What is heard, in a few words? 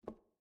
Footsteps Wood Land; Main Character